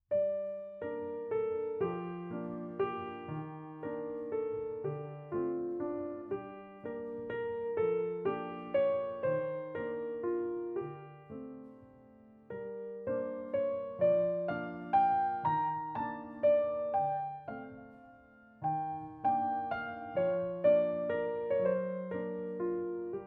piano

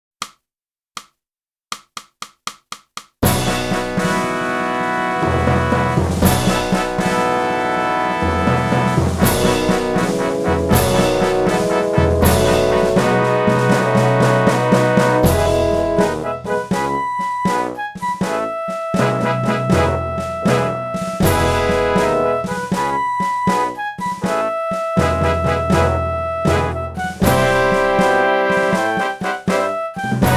flute; boosey

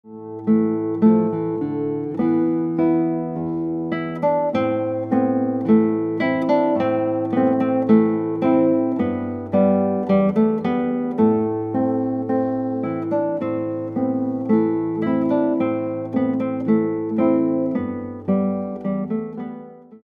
Guitar

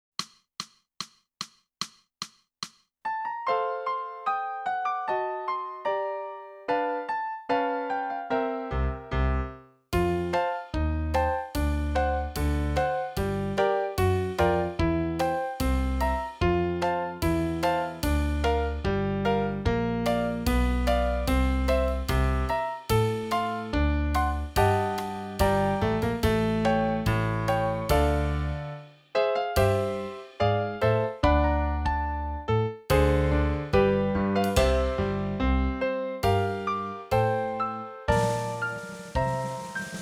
sax

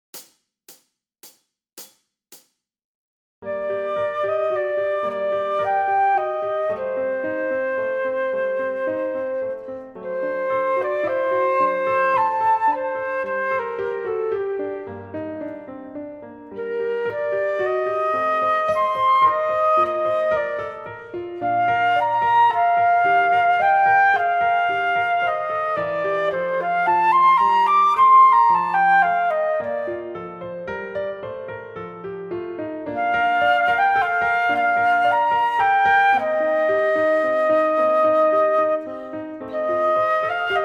Sciliano Full Flute

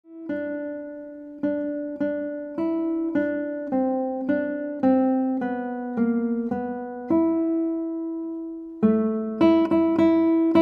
Guitar

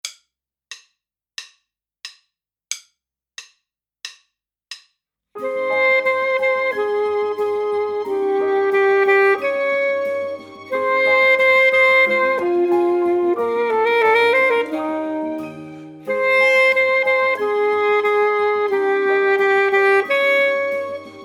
Full Sax

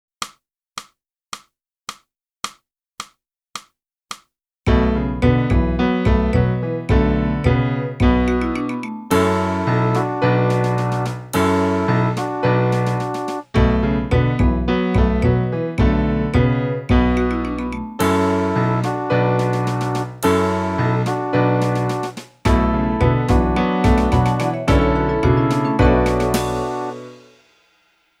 flute; Boosey Woodwind Method: Flute (Book 1)

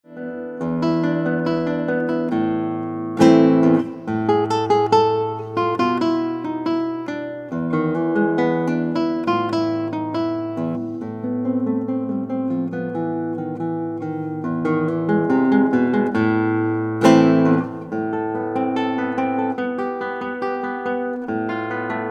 Guitar

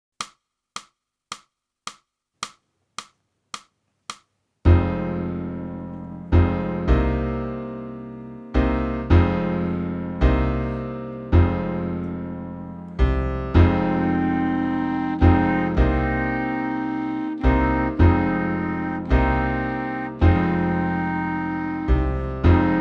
clarinet; boosey method

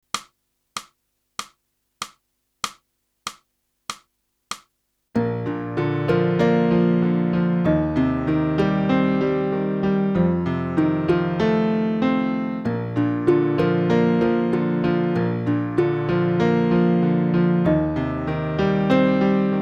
sax

